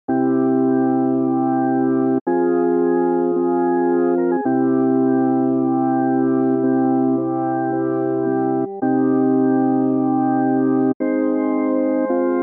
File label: funeral music keyboard; purcell; for queen mary